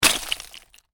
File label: Ice impact medium, Ice: medium impact with debris; Snow; Weather; Stuart Duffield